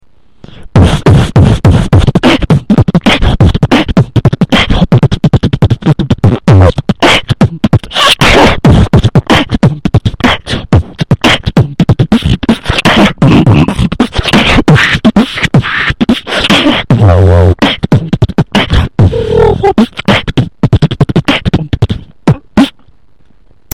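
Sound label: Beatbox Freestyle; Мой фристайл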